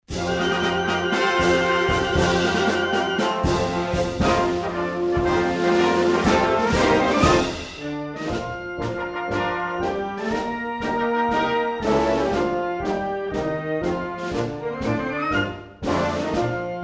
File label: The Army Song Band Only